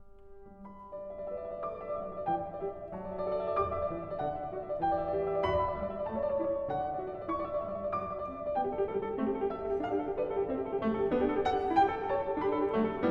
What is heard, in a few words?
Bach Goldberg Variations BWV988 Variation 28 - Allegro